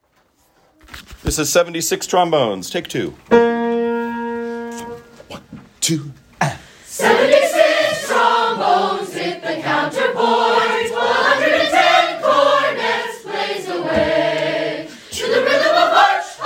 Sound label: vocaltracks; Rehearsal Tracks